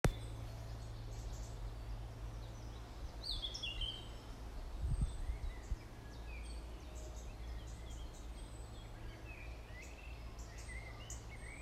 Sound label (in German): Vögel